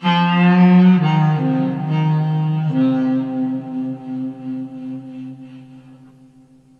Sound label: Sax Effect; Effected; Sonic Foundry (Sony Creative Software); Jazz Solos and Sections